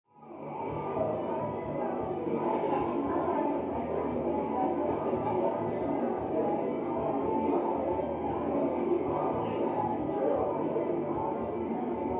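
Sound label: Party Busy Adult Walla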